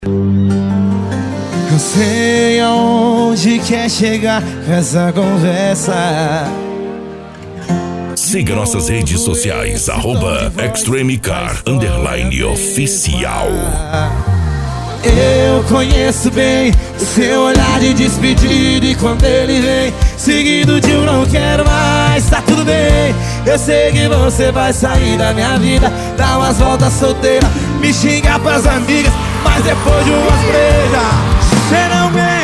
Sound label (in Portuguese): piseiro e sertanejo